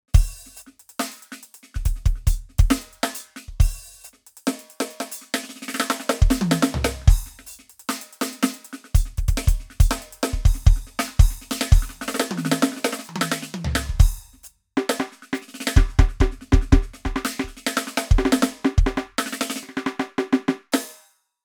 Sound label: Roland TD-17K-L test superfilter